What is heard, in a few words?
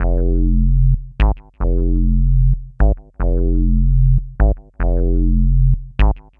Punch Bass; Sonic Foundry (Sony Creative Software); Knutrix Drum & Bass